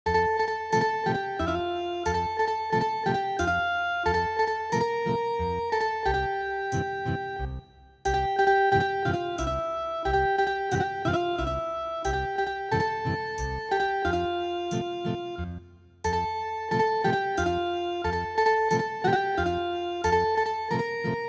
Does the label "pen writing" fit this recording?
No